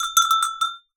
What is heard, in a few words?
Cowbell Chain, Percussion